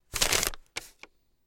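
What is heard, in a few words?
Paper Grab Shuffling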